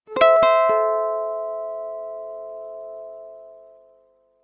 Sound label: lace, message tones